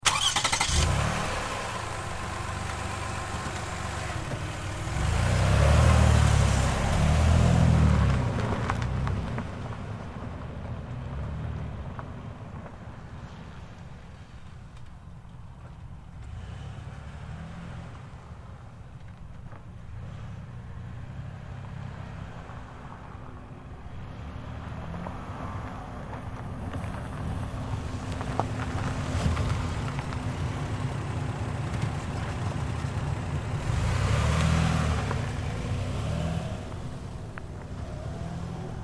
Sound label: Car Highlander 3PT Moves; 2005 Toyota Highlander V6; 3 Point Turns; Cars; Vehicles; Big Room Sound